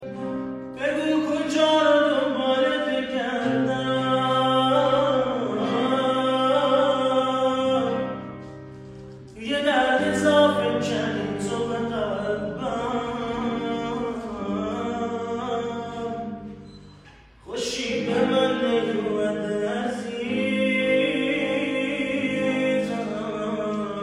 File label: Guitar